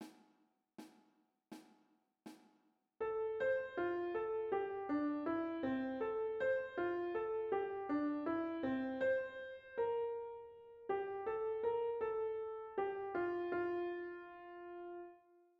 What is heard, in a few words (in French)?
AIR en croches